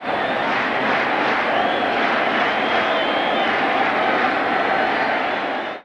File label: Baseball Crowd Claps; Crowds